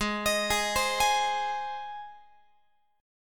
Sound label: Gsharp Major, Guitar; arpeggiated; x,16,18,18,16,19; 4A,4E,5A,5D,5G#,6C#